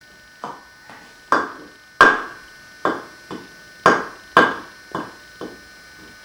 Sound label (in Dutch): blauwe blokje tegen muur